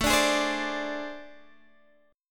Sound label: A Minor Major 7th Double Flat 5th, down, Guitar, Open D